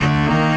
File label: orchestra string hit D